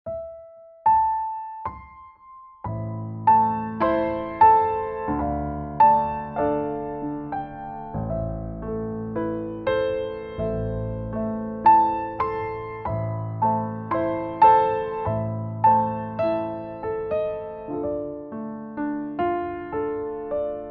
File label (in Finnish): piano